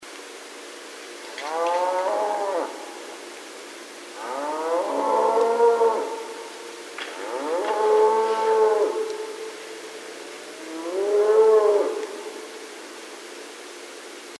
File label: Cattle